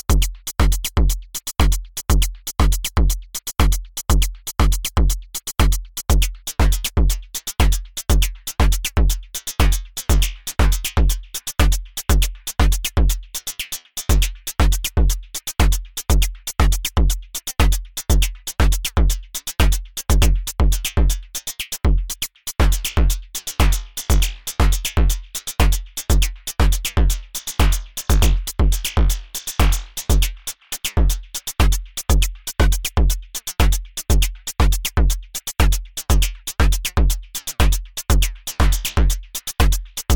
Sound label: modwheel flanger